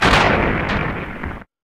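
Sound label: EXPLOS, explosion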